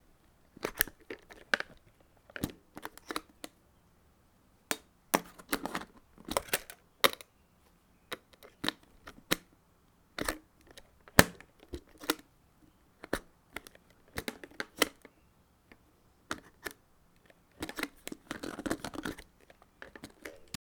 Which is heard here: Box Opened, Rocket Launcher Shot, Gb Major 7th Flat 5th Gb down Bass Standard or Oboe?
Box Opened